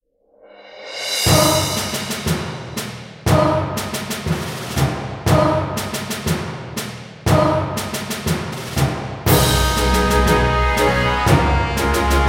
battle mode

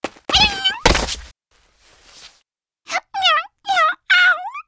Lose Point; Pochi

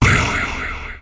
flash fire; battle item; Apollon

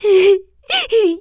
voice Cry

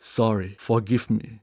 voice Cry

